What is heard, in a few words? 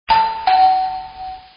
Door Bell - Ding Dong; household